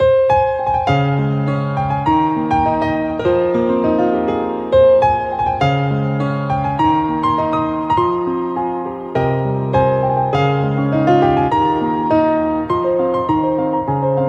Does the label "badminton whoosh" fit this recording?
No